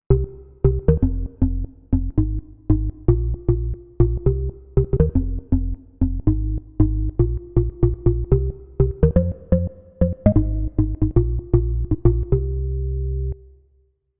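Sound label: Bass House Org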